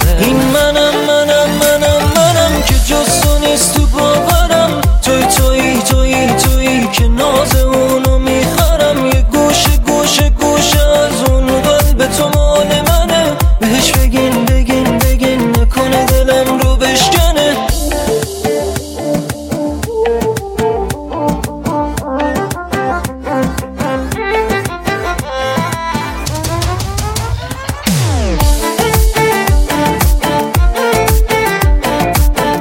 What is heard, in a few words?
Ringtone Mobile